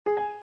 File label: sound Click; ปากกาพูดได้; Toybies